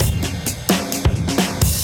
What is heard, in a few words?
Beat Style, 130bpm, breaks